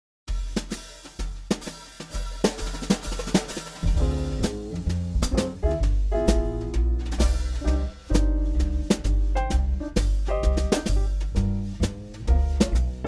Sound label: Dm7b5 G7 long